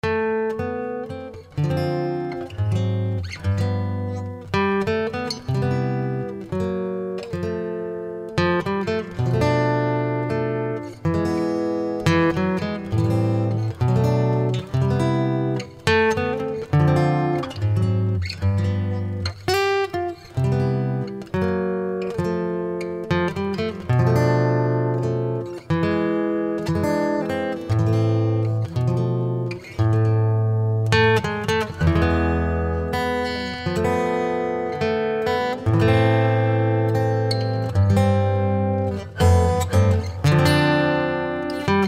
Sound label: Jazz melGt; Guitare seule - Arrangement; MyVirtualBand